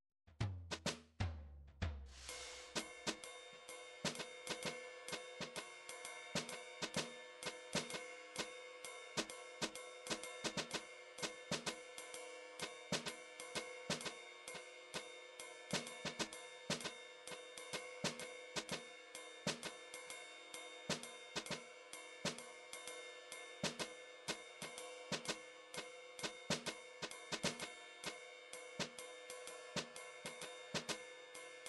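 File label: midi drum patterns drums jazz brush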